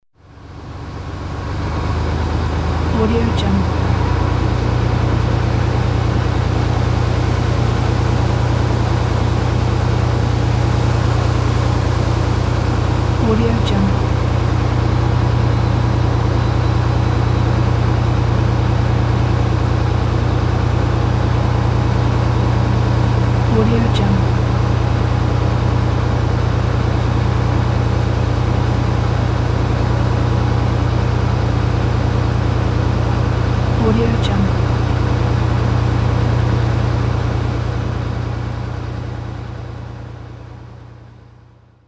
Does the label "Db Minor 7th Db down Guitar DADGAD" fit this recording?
No